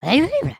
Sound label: Stunned; Lego Strombie; creatures organic